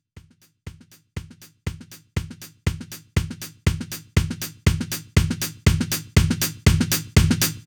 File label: drum